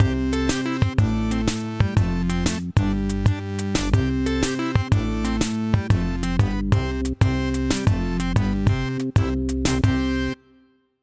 logo ident tech fun no cymbal; soundscrate

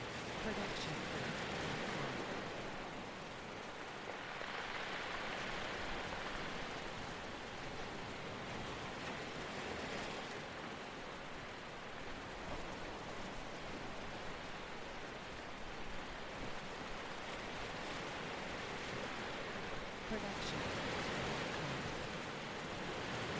loopable waves on pebbles; soundscrate

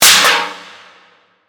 metal impact; soundscrate

